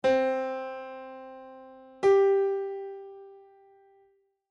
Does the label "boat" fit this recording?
No